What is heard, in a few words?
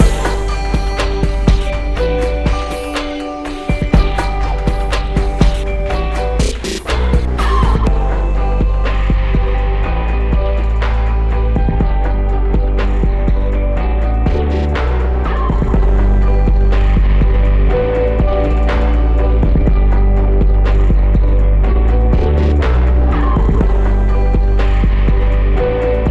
allcomp on filtered mix bus